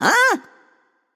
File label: nv confused; cupid